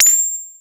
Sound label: Single UI Beep